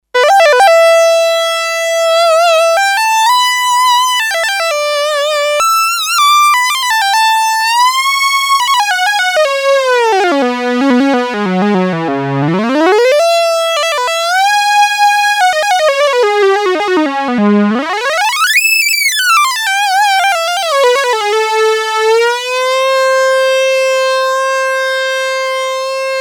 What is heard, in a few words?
Lead 1 with release, Sequential Circuits Six-Trak; Factory Programs